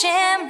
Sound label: vocal